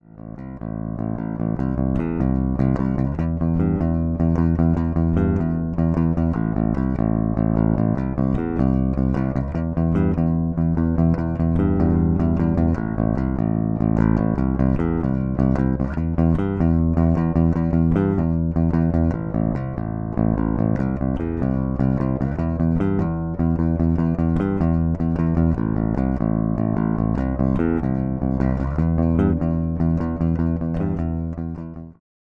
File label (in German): Sadowsky NYC Modern Neck Pickup passiv